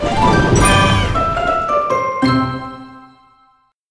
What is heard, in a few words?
Crunchy Finish; Cookie Run Puzzle World